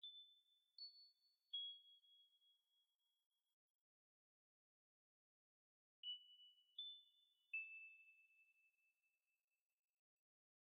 bells

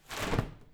umbrella close; OneShot Fading Memory

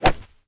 iron hit rough

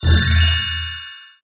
magic hat in

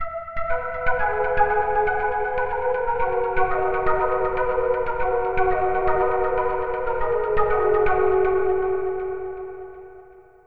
major puzzle solved; OneShot Fading Memory

